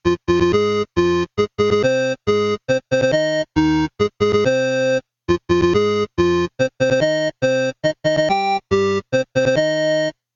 Invincibility Powerup; Scrapyard Dog; LYNX

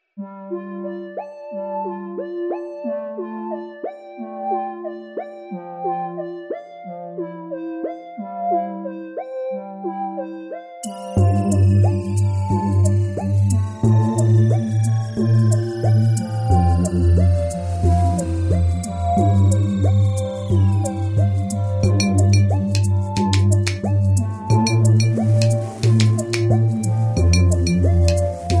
Ambient

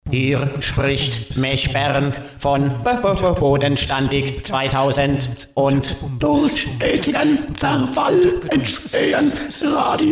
Jingle; Bodenstaendig 2000

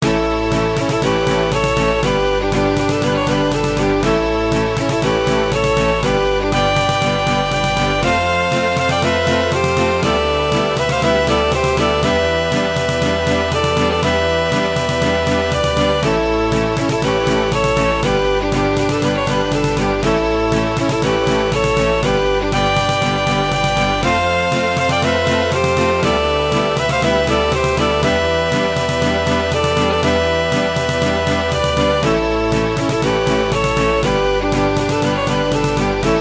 Title & Menu

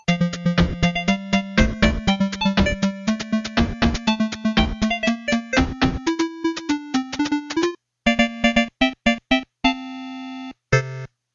Final Victory; Scrapyard Dog; LYNX

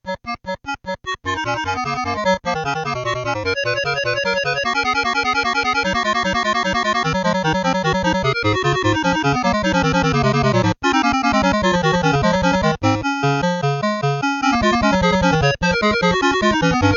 Panel Music; Electrocop; LYNX

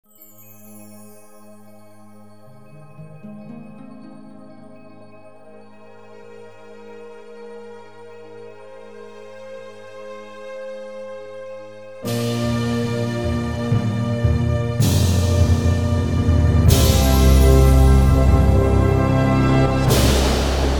Jingle; Djamm

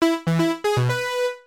Level Start; Fruit Factory

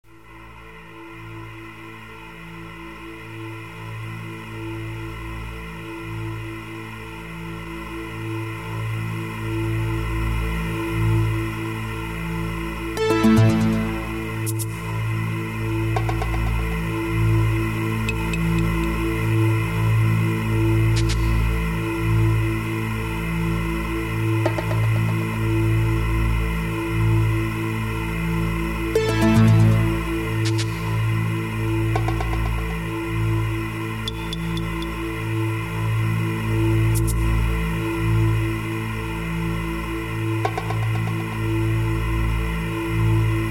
Synthetic Noise Intro

